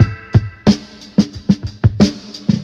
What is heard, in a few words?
ringing note, drum loops boombap